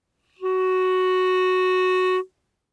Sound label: Bb Clarinet ff Gb4 stereo; Woodwinds